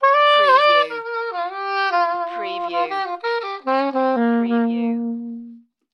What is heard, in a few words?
Sax Smooth Riff